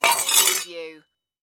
Sword Pick Up Scrape

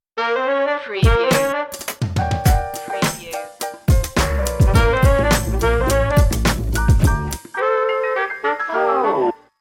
comical detective stinger

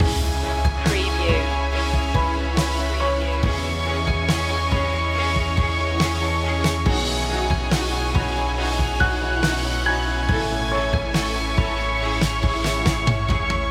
epic strings loop

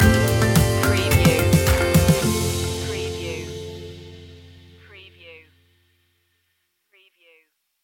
taking flight stinger

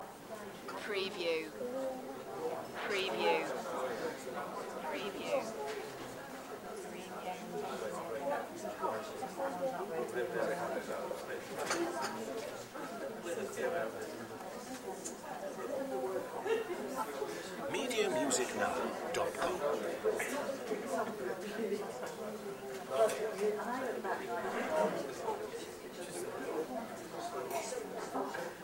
bar small classy

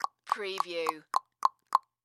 Tongue Clicks

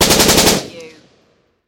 Machine Gun Sig SG5

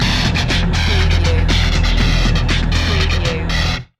remix loop

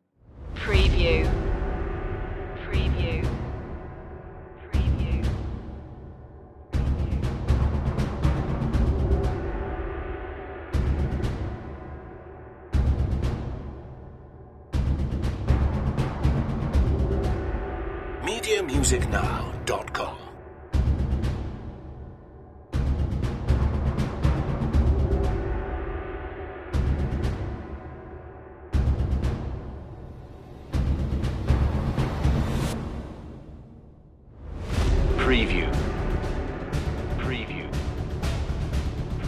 effects and perc only

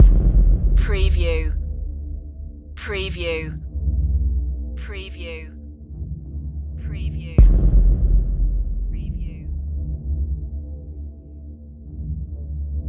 dark afterlife loop

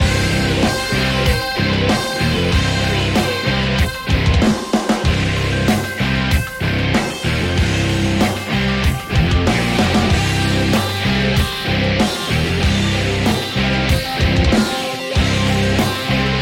attack of the guitars loop

